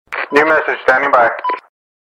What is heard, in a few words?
Military Ringtone